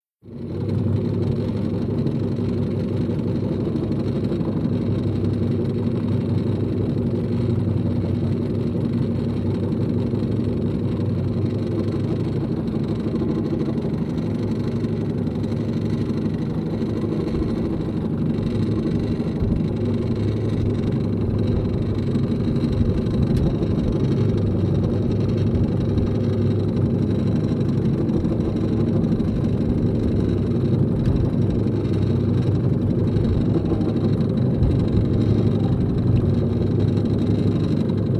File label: Otbrd Motor Int CU Idl, motorboats